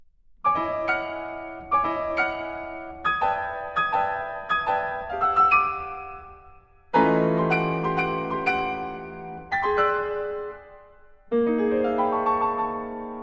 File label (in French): La Grive musicienne